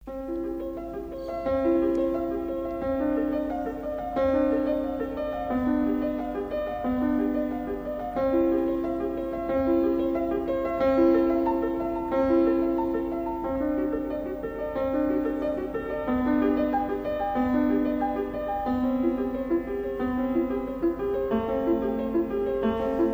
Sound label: Prelude in C major, Clavier; Prelude and Fugue in C major, BWV 846; Bach; The Well-Tempered Clavier; Book 1